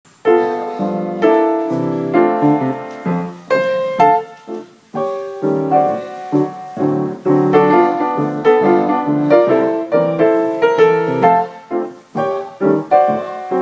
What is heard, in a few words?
2/4 medium tempo, ballet music